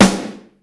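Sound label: snare rock mute, Yamaha Motif ES drum machine; 300 Drum Machines; Yamaha Motif ES-Series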